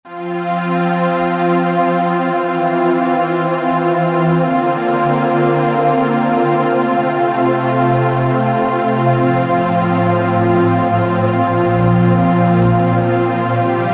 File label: Underscore PAD; Worship Flow